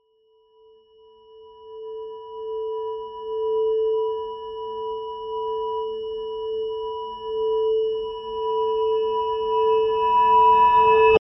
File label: Trailer Tools High pitched Reverse; Production Music; CATastrophic